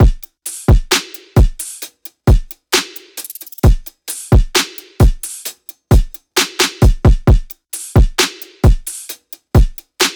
loop full 132; Seismic Loops

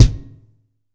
MUDDY KIK, DRUM KIT; AKAI S-Series CD-ROM Sound Library VOL-4

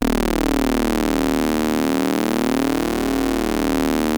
OB D#2, Rare Synthesizer; Hollywood Edge - Giorgio Moroder Rare Synthesizer Collection; OB 4 VOX.

